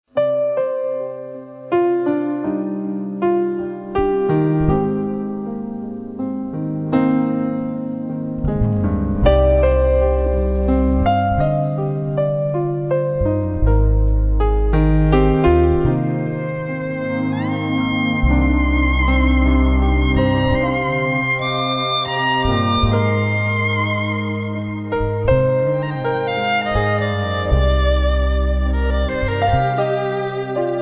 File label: Nigun Melody; Nigun Mozdzitz-Baalshem Tov